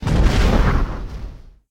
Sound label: explosion